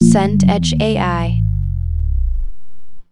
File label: sub drop note